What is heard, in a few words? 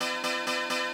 brass; Pony Fan Music Remix Stems